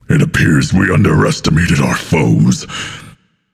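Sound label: non-verbal boohoo; smite; atlas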